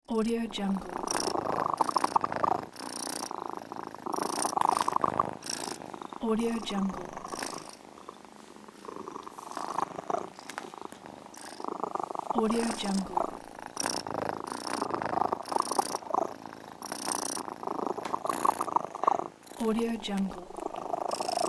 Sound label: cat purring